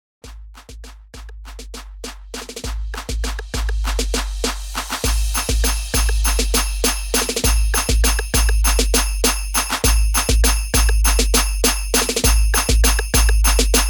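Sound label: Christmas instrumental 100 BPM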